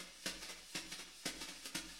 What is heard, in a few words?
Jazzbrush Beat